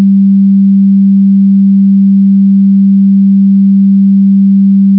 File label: tuner g